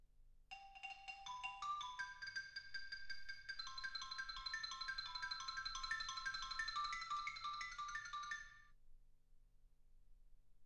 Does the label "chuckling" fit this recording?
No